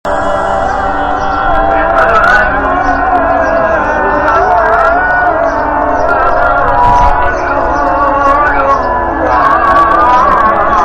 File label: calls to prayer from ankara castle